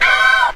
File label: ow disco group; ooh ahh; ow ouch